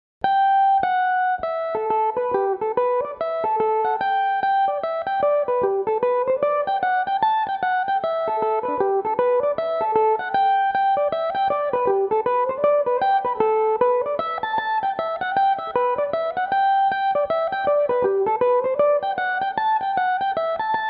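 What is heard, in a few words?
A dorian, irish mandolin, tunes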